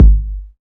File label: subtle reverb kick g sharp key, Royality free kick drum single hit tuned to the G# note